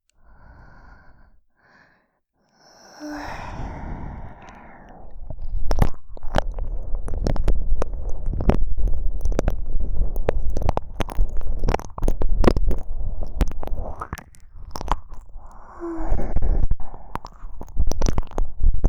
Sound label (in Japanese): 耳奥ぐりぐり攻め＆舐め